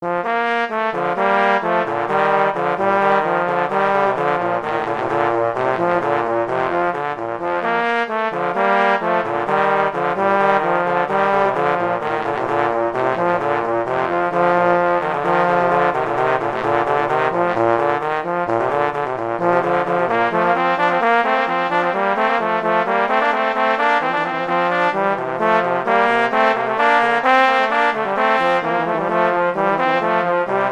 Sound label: Allegro; Telemann; Canonic; Sonata 1